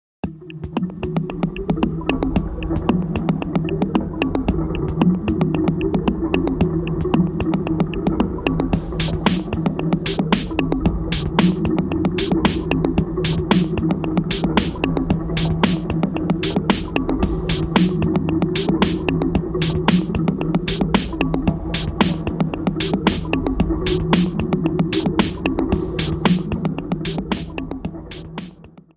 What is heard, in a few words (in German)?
musik hoerprobe